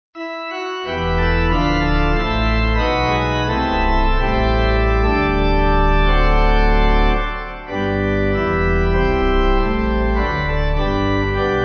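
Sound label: Pipe Organ; O Lord Most High, eternal King; Gonfalon Royal 8.8.8.8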